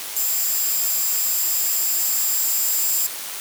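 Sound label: bpsk 16 channel 500 baud; f13.119 mhz bw48 khz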